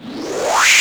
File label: noise fx; Masterbits; Switch FX